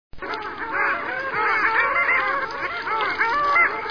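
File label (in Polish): kilka lodówek, głosy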